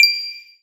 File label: Enemy Train Light On